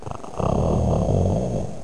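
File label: low snore, household; Web Clip Empire 150,000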